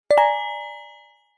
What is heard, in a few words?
multimedia notification mallet generic with slightly harsh resonating tone; zapsplat